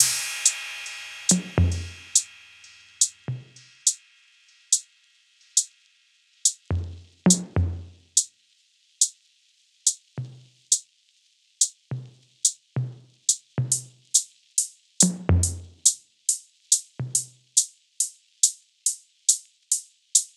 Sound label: perc 70; Drum Sample Pack; Loops; SD SEISMIC; Slate